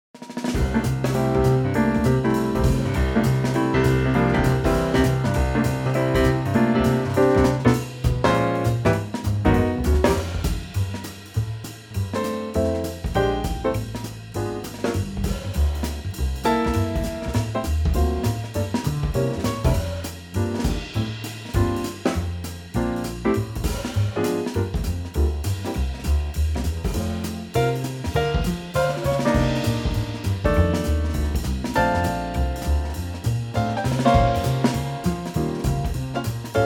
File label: F trio; key - F; Superb swinging Trio arrangement of the classic standard, popular as a jazz vehicle